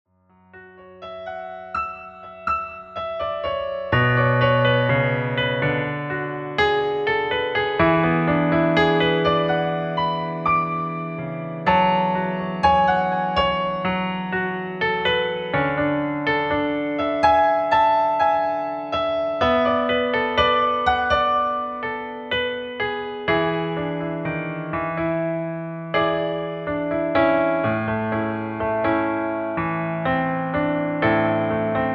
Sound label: Improvisation